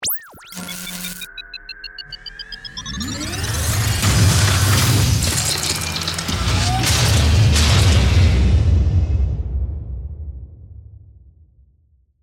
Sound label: Mechanical Electric, Digital, Power, Up, Morph, Metallic, Slams, Transforming, Robot; Electrical; Imaging Element; Accent; Transition; Production Element; BlastWave